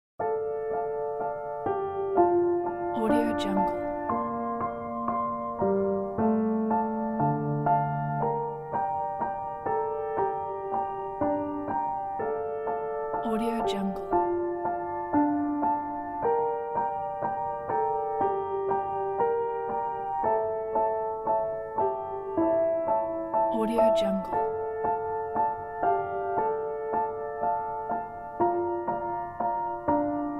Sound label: sad piano piece